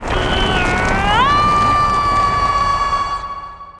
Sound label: shield; gohan